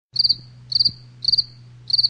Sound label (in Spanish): Grillo